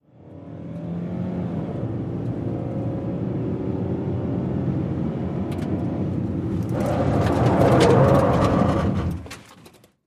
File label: Sm Car 180 Skid, compact